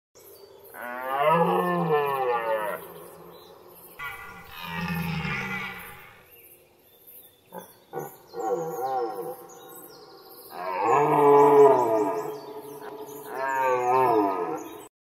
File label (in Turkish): Geyik sesi, kizil geyik